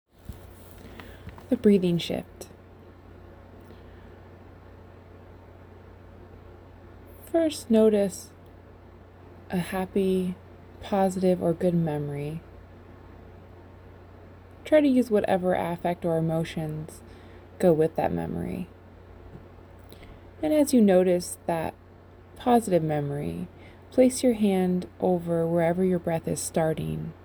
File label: Breathing; Shift